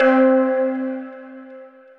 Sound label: Minor Metal, Mallets